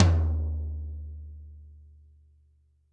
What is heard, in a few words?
Toms, Drums; Bob Clearmountain Drums I